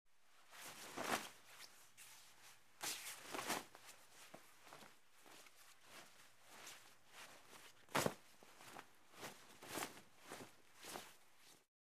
Cloth Movement, cloth movement

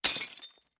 Glass Breaks 2

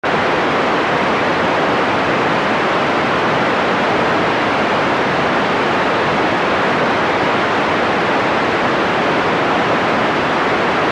Static Heavy Thick, static

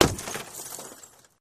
Glass Smash Auto Win

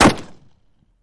Ak47 Gun Shot Silenc

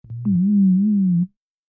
Alarm Synthetic; hi-tech-various

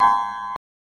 Video Game Transiti

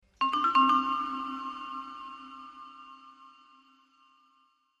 Vibraphone Shrt Acce; mallets

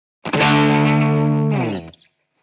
Electric Guitar Soft

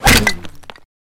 Bullet Impact Brick, stone

